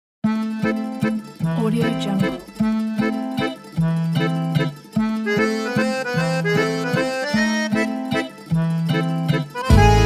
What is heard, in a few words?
italy logo